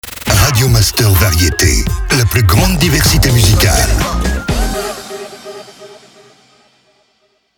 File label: Jingle MEDIUM; Radio Master VARIETE